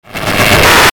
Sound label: Static Crescendo Short Fast; Production Element Imaging Element Accent Transition; Blastwave